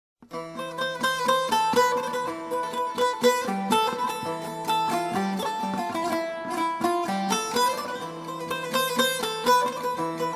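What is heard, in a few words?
guitar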